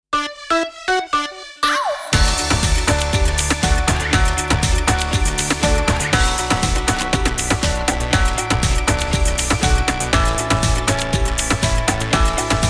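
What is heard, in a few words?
Sung Jingles, 120bpm D major, Ramp; Radio Insuperavel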